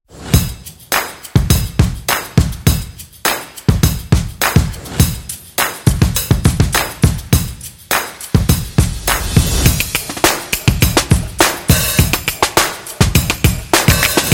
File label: Lifestyle Calm Percussion; calm drums